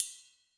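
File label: Sizzle Lite, Cymbal; Yamaha DTXpress-II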